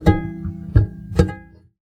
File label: Classical Guitar Extended Technique; Classical Guitar Extended Technique Mono